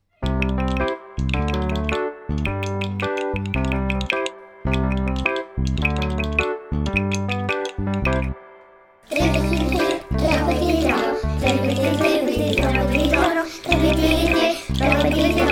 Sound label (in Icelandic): Drippedidripp; Verk nemenda; Tonmennt